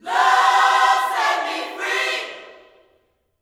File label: LUV FREE, Vocal Gospel; Vocal Planet Vol1 Gospel